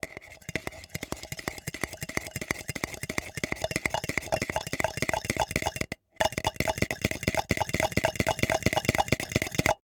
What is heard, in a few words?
fx spinning; best service Blue Box; mixed sounds long